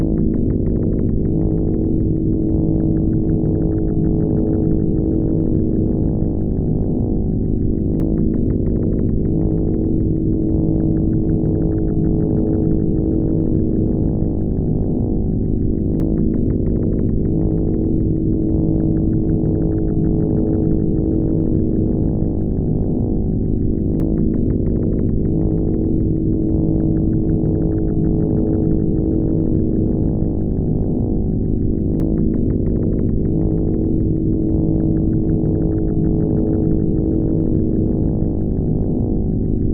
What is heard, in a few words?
maple clicking